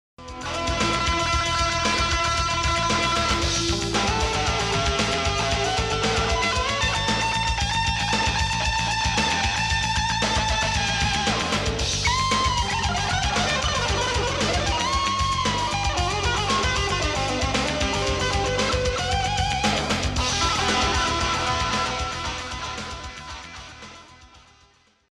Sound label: GUIT